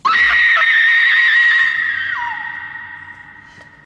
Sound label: Scream Of A Woman